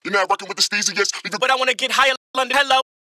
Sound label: vox